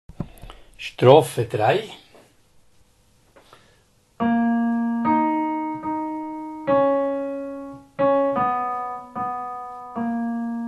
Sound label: Bass